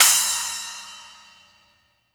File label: 10 inch, Crashes & Cymbals; 10inchf